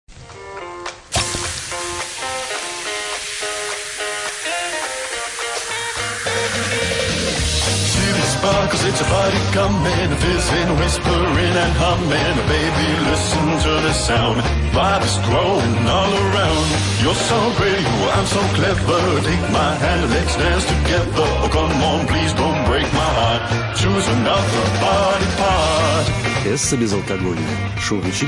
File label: Party Noise; iz reklamy piva Essa